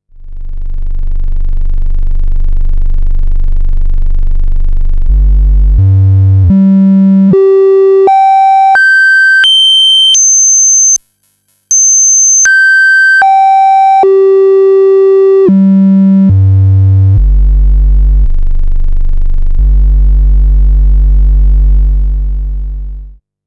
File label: Oscillator Triangle